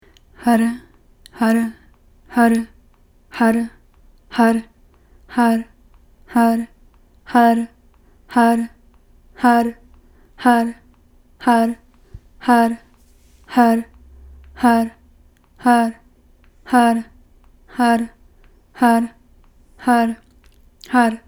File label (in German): Har Rhythmisch